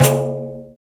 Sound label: Pandeiro; Roland BK-5; Percussion